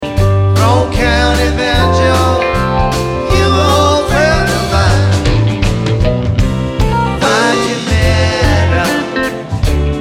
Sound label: Banjo